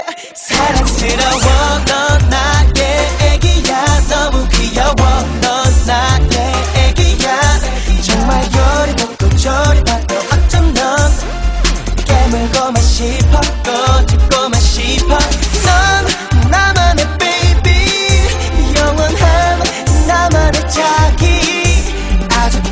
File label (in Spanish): Grito